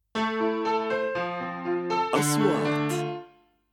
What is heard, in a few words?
intro piano